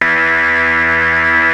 OSCAR E2, synthesizer; Hollywood Edge - Giorgio Moroder Rare Synthesizer Collection

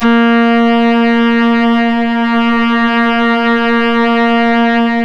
Synclav FM STRINGS A#; Big Fish Audio

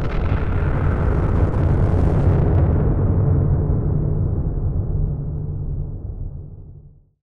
Blast, Explosions

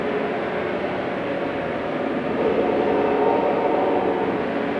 Cracklature, sparse soundscape

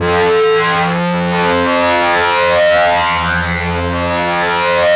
FLYER F1 L, Yamaha VP-1; Music Store; 90's Sample CDs

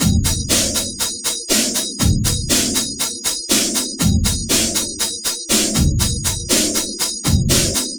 Spectral Beat, Drum Loop; Spectral CDP Beat; Sonic Foundry (Sony Creative Software); The Electronic Music Manuscript Collection; Drum Loop Structures

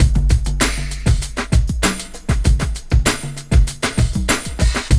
Loop, Roland; Sound & Vision - Gigapack I

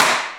SLAP, INDUSTRIAL

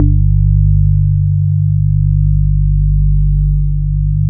MIXTE BASS, Bass Analog & Synth; USB Soundscan vol.12; AKAI

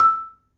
E4, Marimba; Terkelsen

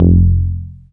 BAS FM DX-7

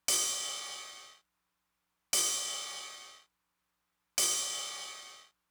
ride, Roland TR-909; Roland TR-909 Ableton Project

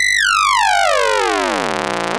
ARP2600, MODULAR SYN; Vintage; Sonic Reality; Vintage Timetraveler

